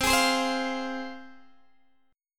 C Suspended 4th Sharp 5th, C, down, Guitar, DADGAD